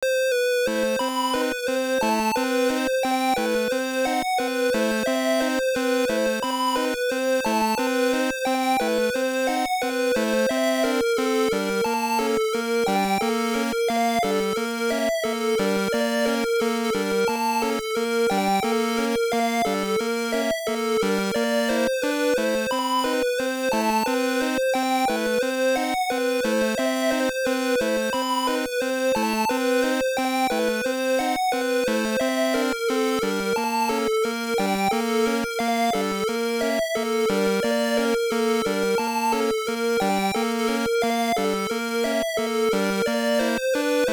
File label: The Highest Floor (Arranged); makai toushi saga wonderswan color gamerip 2002